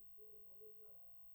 C Bass DI

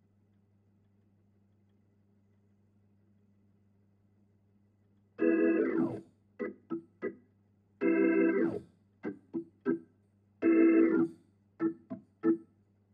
Hammond

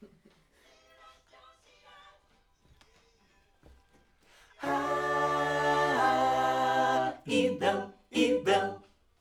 Backs AKG

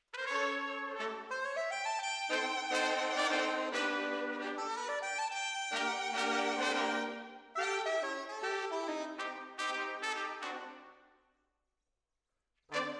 Decca, Jazz for piano

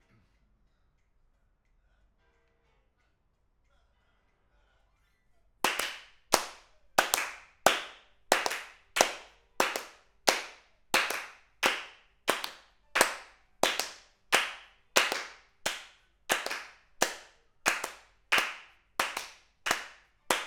Voc

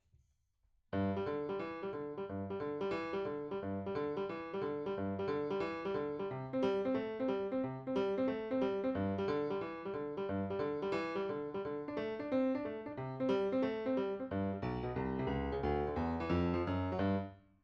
PIANO, RNR for piano

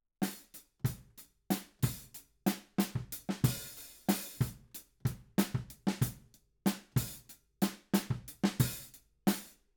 Ride

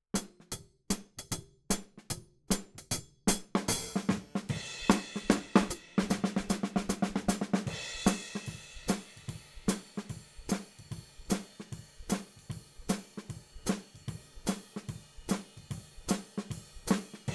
Hat

